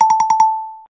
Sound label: Notification